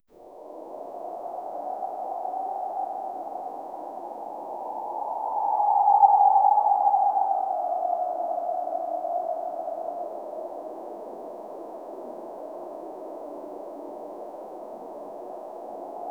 the soundscape narrows to, savanna

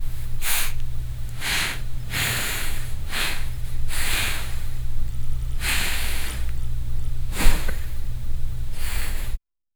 realistic binaural asmr, massage

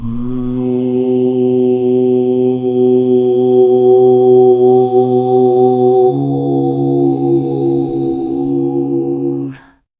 gregorian chanting smooth

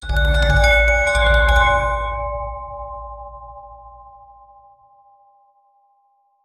epic scary ring bell

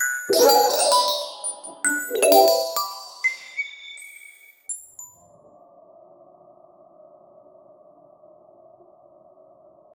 dolly zoom suspense, film

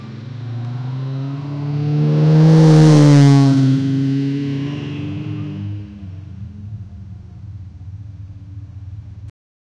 several motorcycles revvi

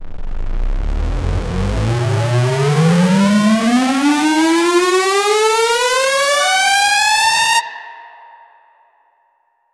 epic supersaw riser, build up

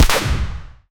single gunshot sound; fps

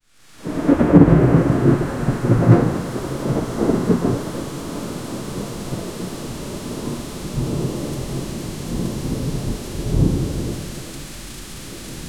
thunderstorm on a bright, weather

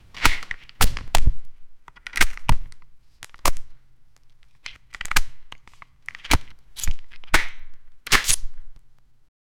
fighting sound

